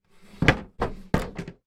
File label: household cot wooden side slide up or down; sound effects one